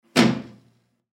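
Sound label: small window slammed shut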